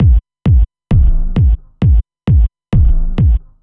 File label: Kick Loop